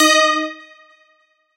countdown

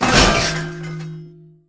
hit

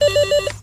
digger

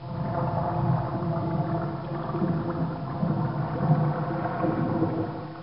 lawnmower

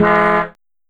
menu next

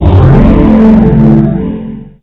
menu next; io tracks circuit; motorsportsland

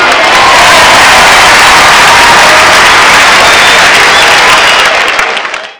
alarm

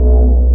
digger

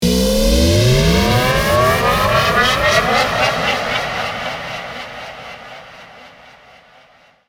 stream

